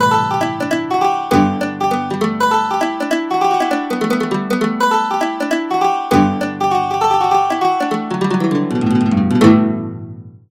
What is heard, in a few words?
The Low Highland Octave Mandolin; Banjodoline; Syntheway